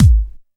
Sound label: short reverb kickdrum sound g sharp key